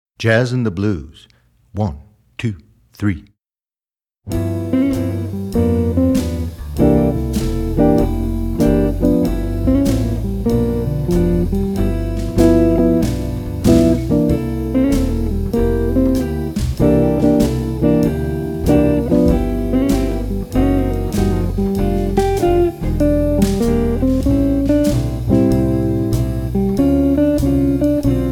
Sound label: Jazzin The Blues